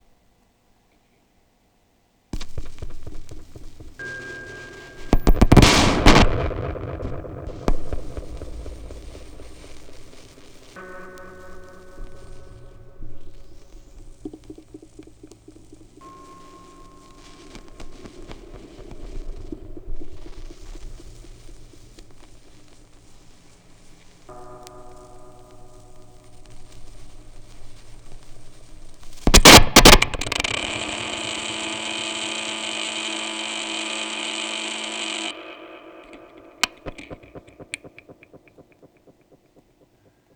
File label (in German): schallplattengeraeusche, Tape Echo Mix